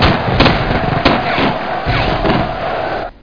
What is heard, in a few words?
melee; PC Gamer IT CD 15; bgw demo